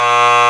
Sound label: error